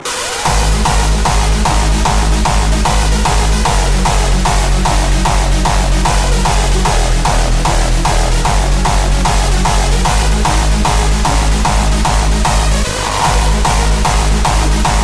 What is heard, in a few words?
Hardstyle